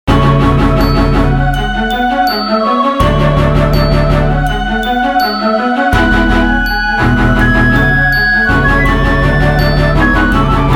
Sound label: Instrumental Tone; Jab Tak Hai Jaan